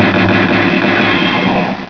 SHOOTER

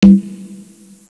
conga; FISHWISH

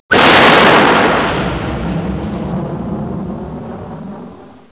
EXPLOD

